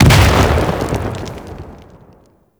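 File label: Mortar Projectile Explosion; Warframe; Venus; Venus Terra Mortar Moa; Abilities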